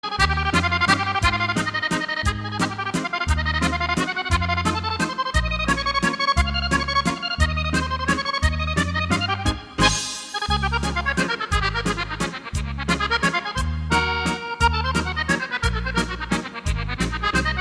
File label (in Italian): Mazurka Volante